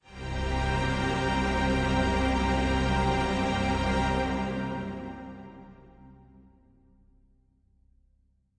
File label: Heavy Strings C; PADS and STRINGS